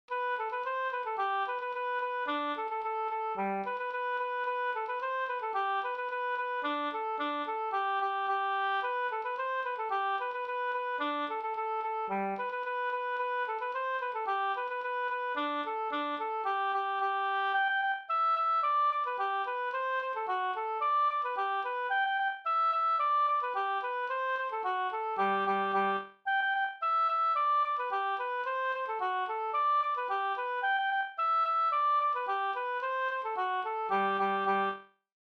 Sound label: polka